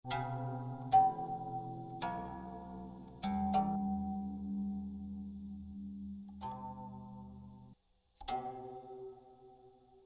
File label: LOOP; THESIS